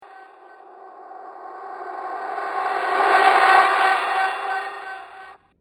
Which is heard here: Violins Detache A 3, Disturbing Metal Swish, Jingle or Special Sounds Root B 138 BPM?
Disturbing Metal Swish